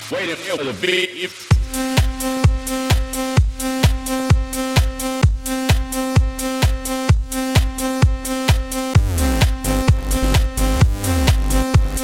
Electro

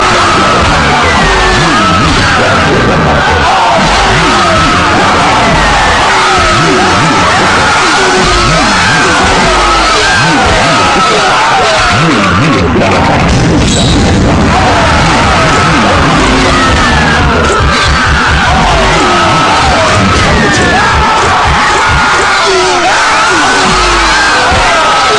Screams

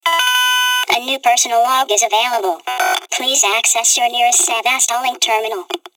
tone; Alien Isolation

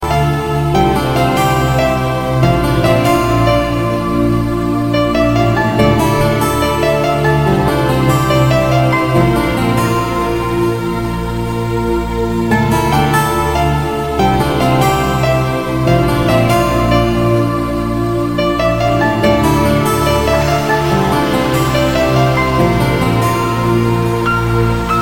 Romantic Tone

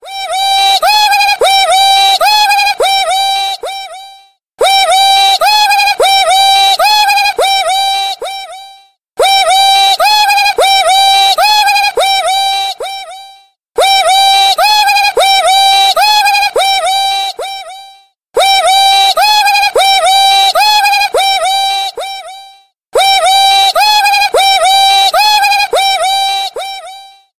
Loudest Buzzer Alarm

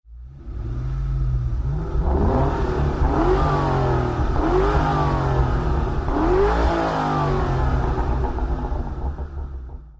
Shelby Gt500 rev

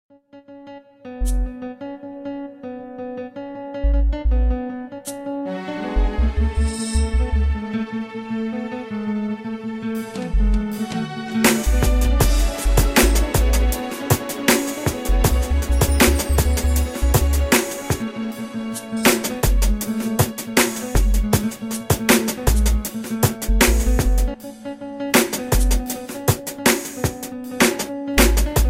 Mellow Beat

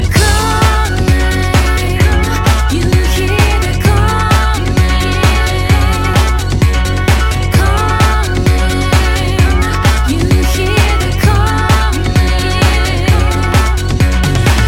Calling

